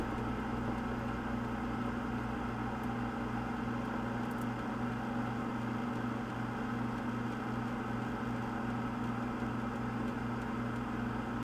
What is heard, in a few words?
Fridge Loop